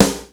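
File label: snare heavy, Yamaha Motif ES drum machine; 300 Drum Machines; Yamaha Motif ES-Series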